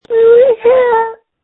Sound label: scream